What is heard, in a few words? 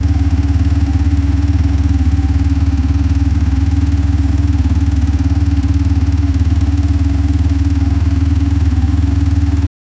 classic vespa scooter cru, scooter